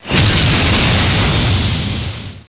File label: napalm